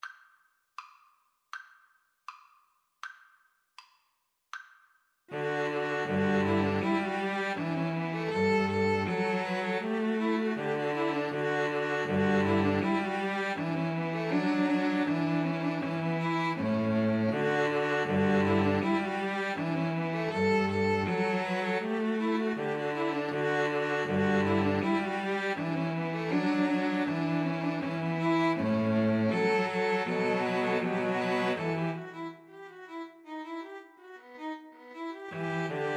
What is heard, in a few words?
Bach Gavotte Cello Suite 6, String trio